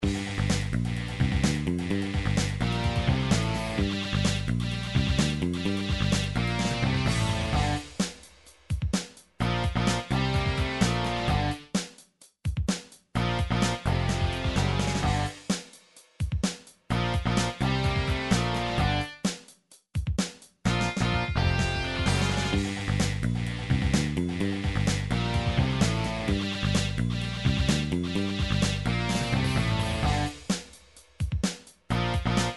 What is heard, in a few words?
menu music; nintendo 64; sequenced; rush 2